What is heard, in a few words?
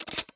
nova insert shell; arccw go